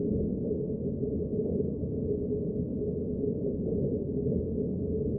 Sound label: Eavesdropping Ambience Dry Room; Soundproof Walls 2.0